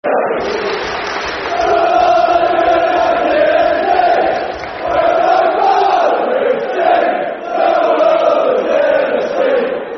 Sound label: Football Chant, Soccer Songs; Scun United F.c.; Scunthorpe United Scfc